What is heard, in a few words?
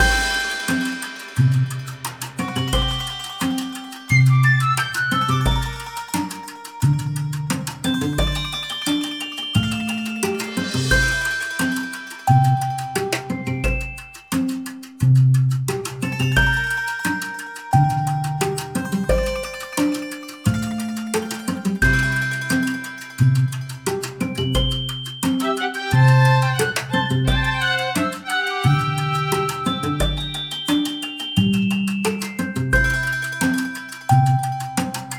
Fishing Village Map Narrative; burly men at sea ios macos ps vita ps4 switch windows gamerip 2016